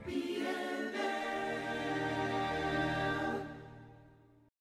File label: chorus